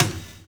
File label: analog fi; Univers Sons - Kitch 70s